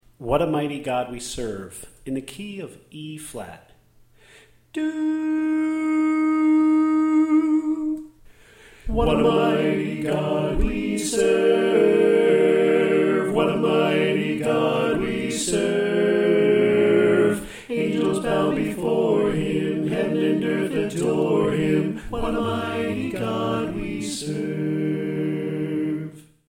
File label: Soprano Left